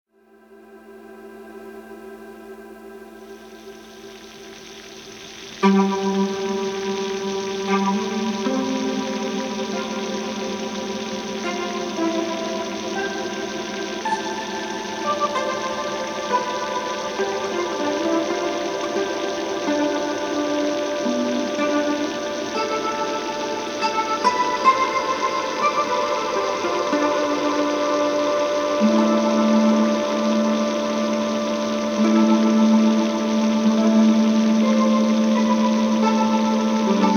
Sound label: Mandolin